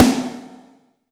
Snare, Alesis DM5

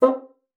bassoon, C4; Xsample VOL-6

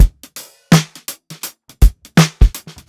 SWUNG 83 BEAT, DRUMS LOOPS; BASEMENT DRUMS VOL 2

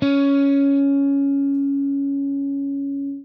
JAZZ, Guitar; Quantum Leap - Guitar & Bass

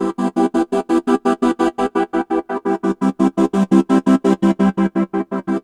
Gated Strings

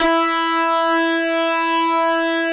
METAL BR

